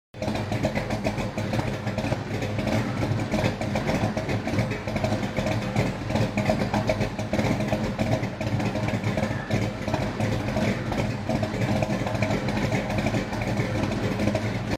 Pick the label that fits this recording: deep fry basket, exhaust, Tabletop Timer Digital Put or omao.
exhaust